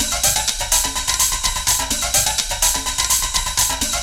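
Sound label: drum loop; X-Static Goldmine 3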